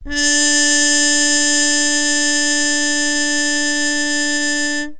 c trumpet harm d4; Horns and Brass

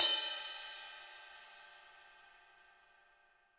Ride A3 Stk1 Bell; Real Kit; REAL LIVE KITS; Multisampled Real Kit BJ-HD9

